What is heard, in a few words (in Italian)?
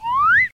Fischio